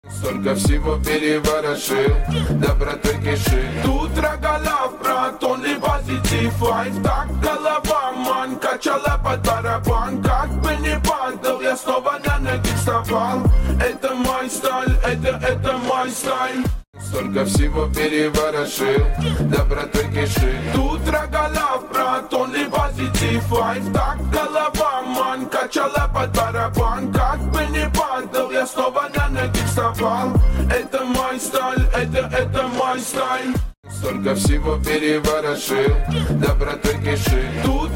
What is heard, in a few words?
reggae